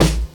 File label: hip hop snare drum sample a key